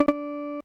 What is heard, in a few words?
menu back; SNES; SMK